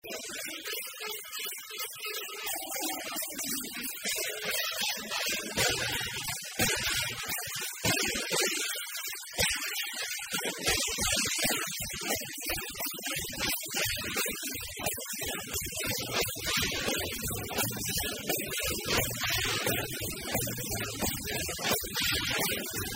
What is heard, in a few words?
Swing